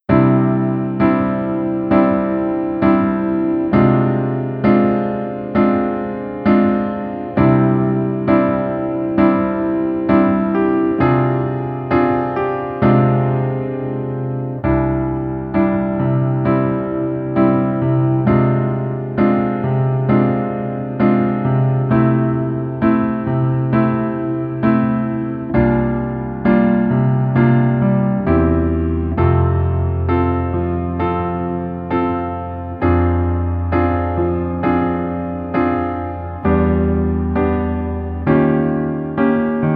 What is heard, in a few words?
Piano ver